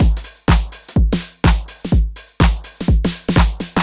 drum loop ninety pop full 125, Classic Chicago House; Sample Magic; Drum Loops